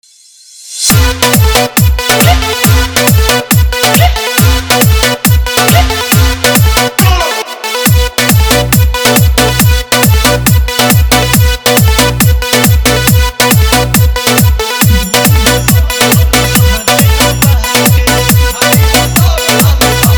Bhojpuri Remix Songs

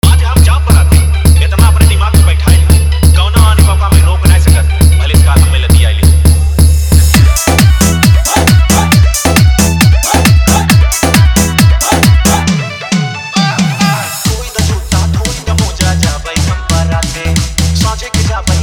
Bhojpuri Remix Mp3 Song

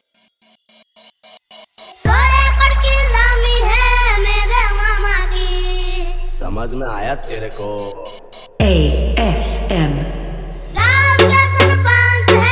Hindi Remix Song

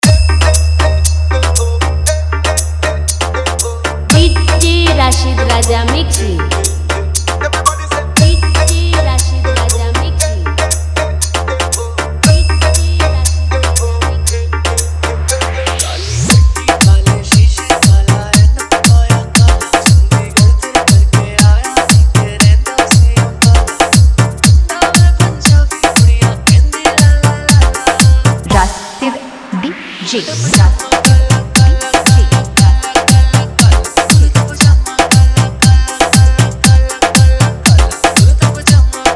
Bollywood Full Vibration Dance Mix; Allahabad

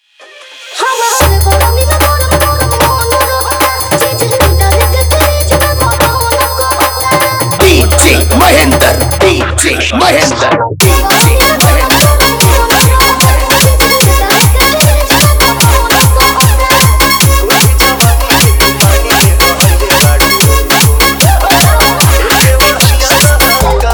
Bhojpuri Remix Song

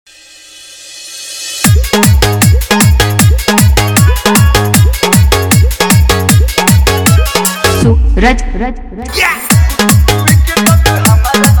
Bhojpuri Remix Song

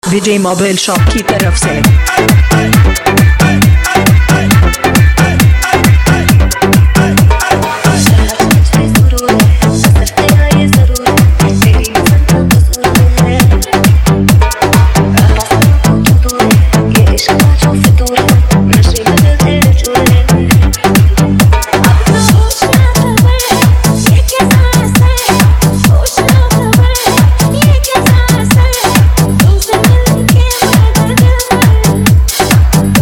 Dance Electro Booster Song Remix

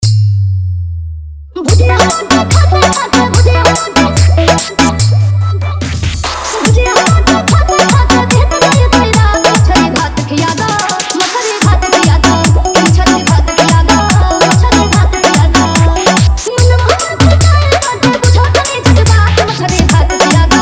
Bhojpuri Remix Dj Songs

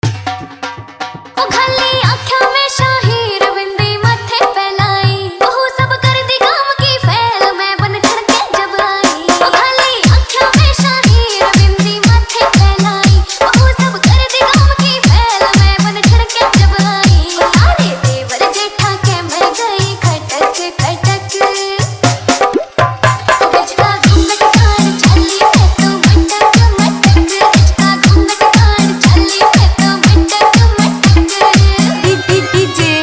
Bhojpuri Song

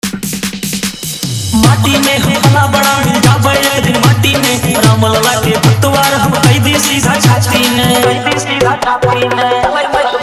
EDM

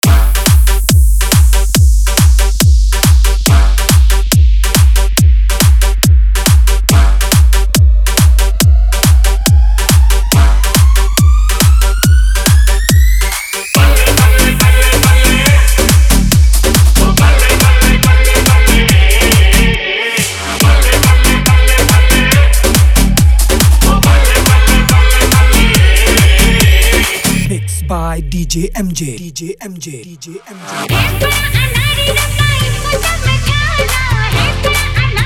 Hindi Dance Remix Song

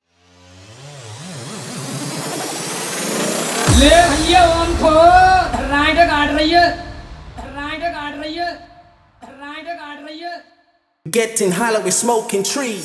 BGM Vs Vibration Music; KGF Chapter 2

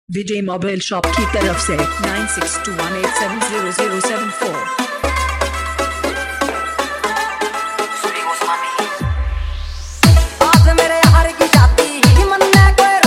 Punjabi Deshi Mix

